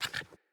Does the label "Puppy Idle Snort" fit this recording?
Yes